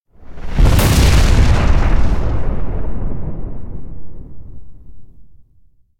Explosion Heavy Scatter Destruction; Hollywood Edge; sound designer's toolkit v2

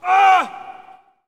Hockey Male Hurt; SFX Kit; Hockey Vocal Sounds

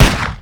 Gun Pistol Shot; SFX Kit; Weapons

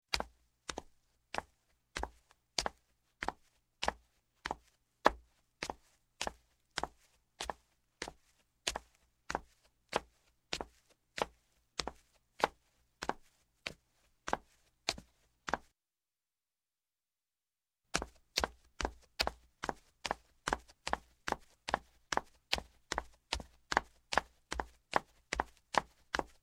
Footsteps, Wood Down Wooden Steps Female, Slow; Sound Ideas 2000 Series

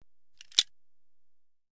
Audience Applause

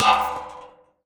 Street Ball Metal Pole Hit; SFX Kit; Street Basketball

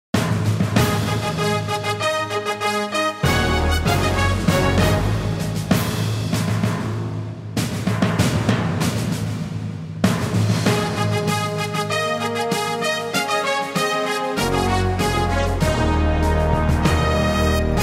SPORTS, Royalty Free Music; SPORTS-GOLD-SILVER-BRONZE

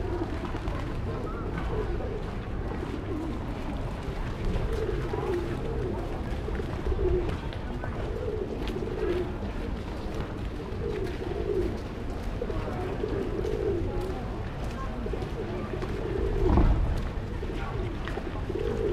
Urban park day pigeons people; urban and suburban; boom library

